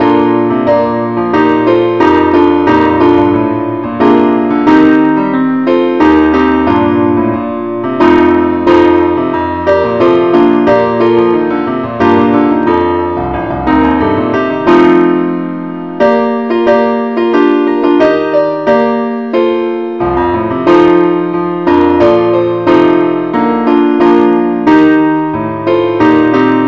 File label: ineedthee keyboard only key bes